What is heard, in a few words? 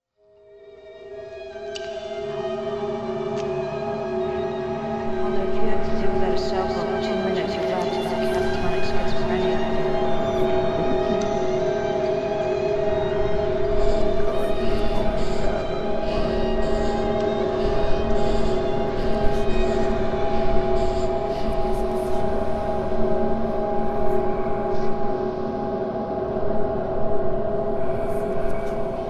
Voices; The Fall - Soundtrack; dark ambient